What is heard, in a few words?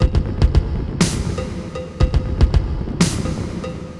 Drama Drums (120BPM); musicradar